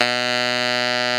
TENOR MF B 2, tenor sax; Greytsounds; Philip Wolfe David Crozier's Saxophones

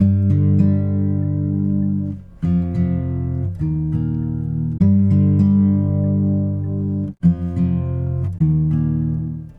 Guitar Only; Sonic Foundry (Sony Creative Software); Friendly Fire

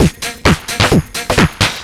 hiccup crunch; Zero-G Deep House; 130bpm

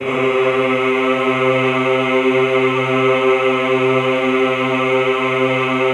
EHH C2, Classical Choir; Best Service; Extended Classical Choir; EHH MIX

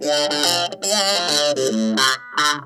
T-TIME, Funk Guitar; 90 s Sample CDs; Zero G

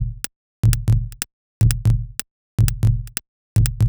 Top A# 123bpm, House Drumloops; Digitalia; Zero-G House Fabrik Drumloops

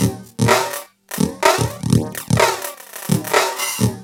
LOOP, TECHNO; TECHNO-BOX; ELEC-LOOPS

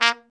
TRUMP; Trumpet

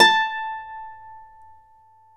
Celtic Harp, Plucked Strings

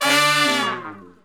BRS, R&R Horns, R&R Falls; Roland L-CDX-03

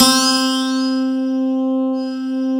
GUIT C3; USB Soundscan vol.51; House Side Of 2 Step; AKAI; GUITAR

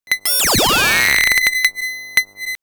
Power Off, ambient synth fx; X-Static Goldmine

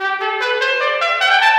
Trombone Effect, effected trombone; Sonic Foundry (Sony Creative Software); Jazz Solos and Sections

